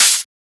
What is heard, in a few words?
Open Hat; FLPRO